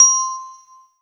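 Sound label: Yamaha TG500 Glockenspiel C6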